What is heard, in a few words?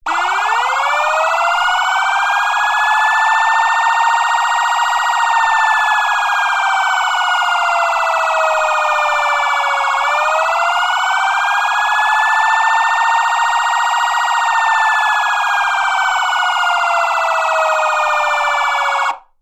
mechanical and phaser, siren; sa441M